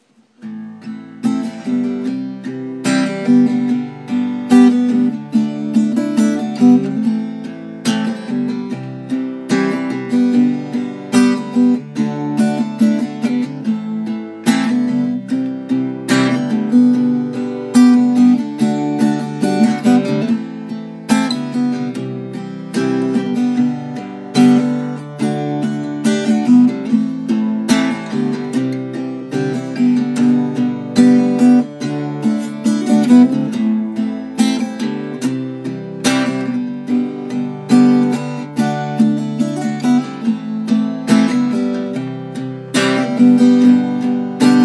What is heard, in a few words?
a minor c g d